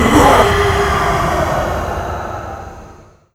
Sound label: mom dash; mothershadow